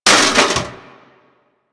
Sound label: Metal Damage, Impacts; Warframe